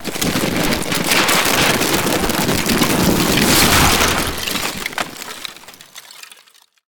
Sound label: collapsing house